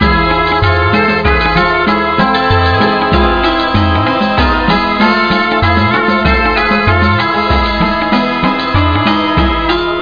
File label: Arabian Cafe